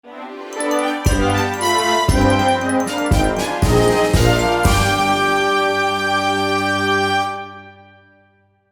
Minigame Intro Jingle

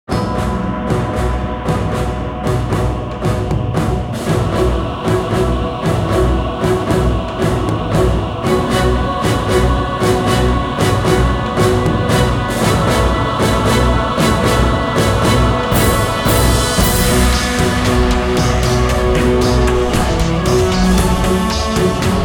music credits music

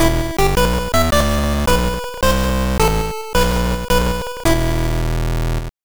Level Failed

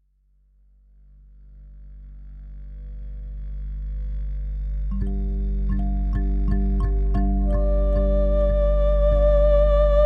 Transition; Ozymandias

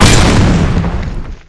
tank hit; blockade classic

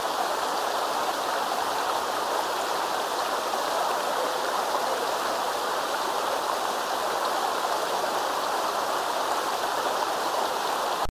waterfall narrow; windows gamerip 2009

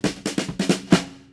Snare; Lego My Style Kindergarden macOS Windows 2000

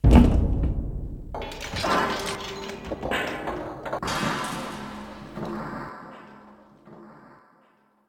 hit metal large; Lucius II The Prophecy

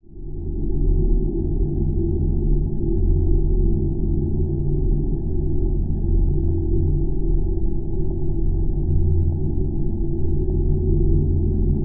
After Results Ambience; Soul Sacrifice Delta

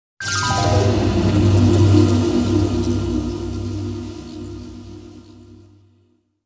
Battle Entry Jingle; Genso Suikoden IV Original Soundtrack

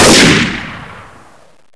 fire 338

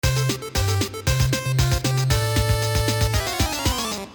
Victory Screen; Bloopy Droopy; Windows

